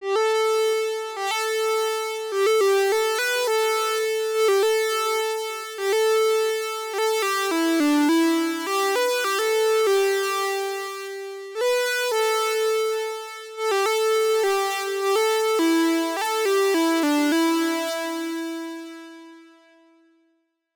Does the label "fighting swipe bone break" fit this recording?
No